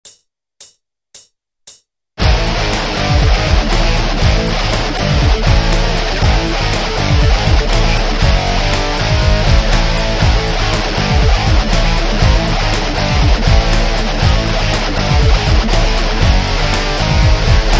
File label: jam track